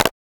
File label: UI Press; FREE SFX PACK VOL 3